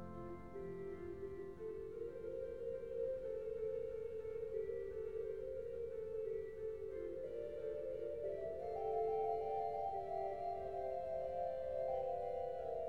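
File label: Fugue, in F major, organ; J. S. Bach; Piet Kee Plays Bach, Volume 3; Eight (short) Preludes and Fuges; No. 4 in F major (first version), BWV 556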